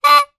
cartoon horn hooter honk single; zapsplat

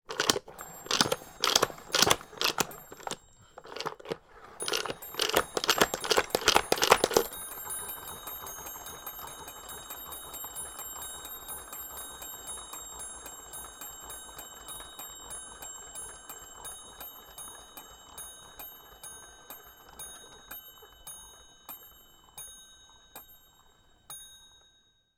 toy spinning top with bell; sound effects one

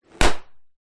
foley balloon pop

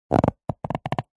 rubber short rub creak; foley; zapsplat

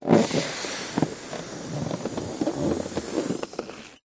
foley balloon deflate puncture air hiss

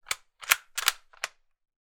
gun karabiner k98 rifle cock

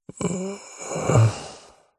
human male light moan groan quiet ill weak pain; zapsplat

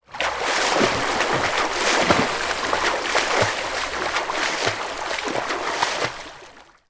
sport swimming front crawl fast; sound effects one

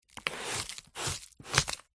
sweeping up dirt debris carpet dustpan brush; foley; zapsplat

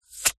animals snake lunge

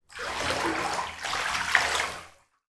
household bath water movement shallow; sound effects one

